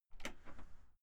entrance door open short outside

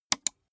gaming mouse left click single

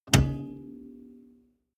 car bmw e46 interior seat lock in ring damped

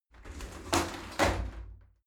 shower slide door open regular

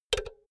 power cord heavy insert remove unsteady; objekte; elektronik

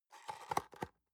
box small close soft

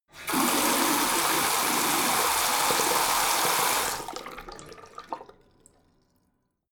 tap flow hard short; haus garten; badezimmer

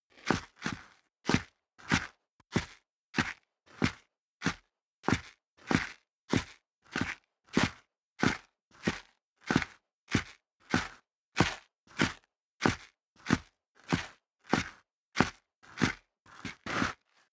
step boots concrete grit downstairs sequence; schritte; Footsteps

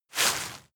step walk graze leaves; schritte

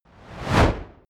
fire torch bright whoosh; swooshes-swishes

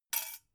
dough hook drop

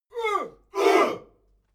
military shout "uh"

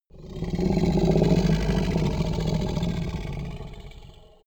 minotaur grunt short; kreaturen

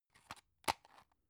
drug close; arzt

